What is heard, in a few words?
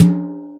Yamaha CS6, Drums